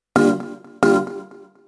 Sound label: fields, 140bpm keyboard; hous-tec